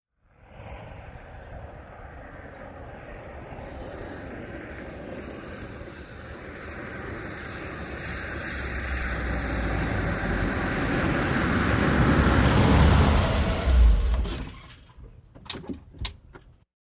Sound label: VEHICLES ASTON MONTEGO: EXT: Arrive, switch off, slow, no hand brake.